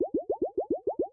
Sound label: idle, weapons; aug; buffaug; cstrike